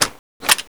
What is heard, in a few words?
Inf Weapons Foley 3rd; Red Orchestra 41-45